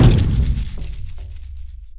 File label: Mus Village Build 120; from dust